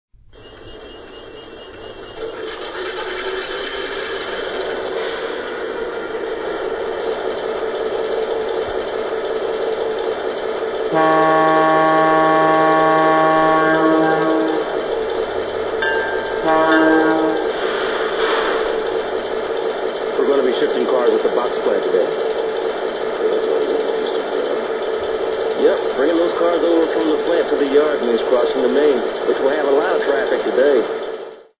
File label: EMD NW2